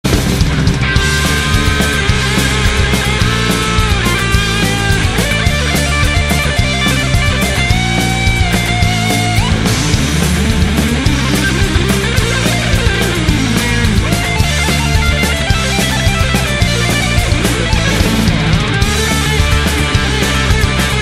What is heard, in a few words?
fatal guitar solo